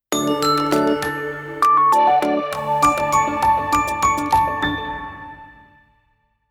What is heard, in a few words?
Casual playful Logo bpm100